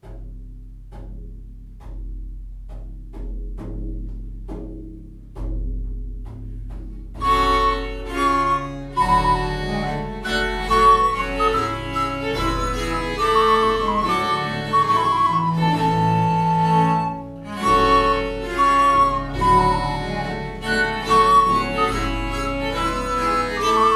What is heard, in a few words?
Marche pour la ceremonie des turcs, Gambe; Lully